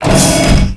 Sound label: ROCK